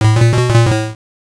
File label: Prize Incoming; Super Mario Land 2; Six Golden Coins